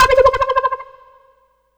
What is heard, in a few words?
EFX Crimson Synth; Polyend; Plughugger